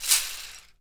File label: abacus lo, World & Orchestral Percussion; ILIO; Synclavier Percussion 2